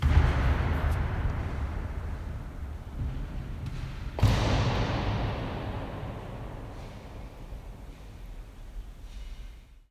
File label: Duomo, Duomo, L'Aquila, 2 hits; Infinity Room